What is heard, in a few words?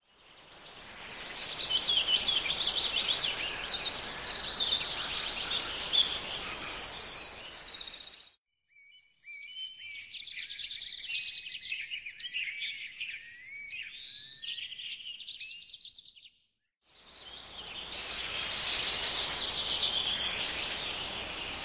background day; OneShot Fading Memory